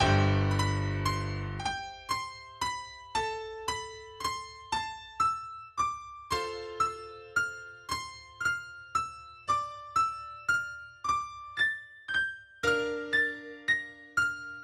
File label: melody groups brass winds